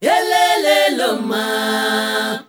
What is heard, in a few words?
ZULU CHR, FEM. CHOIR; Spectrasonics; Heart of Africa 2